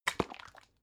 bottle syrup shake single, gastronomie

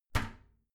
toilet lid slam soft, badezimmer

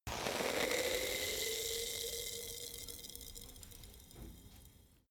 bottle vodka fizz, gastronomie

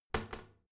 toilet lid wall open regular, badezimmer

